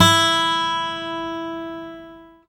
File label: GUI AC. E, Ac. Guitar; Sound & Vision; Gigapack I CD 2; GUI ACOUSTIC 1